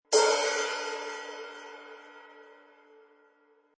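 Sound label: Loop Cymbal Hit 130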